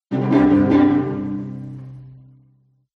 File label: MUSICAL ORCHESTRA Jingle Strings Pizzicato Friendly; smartsound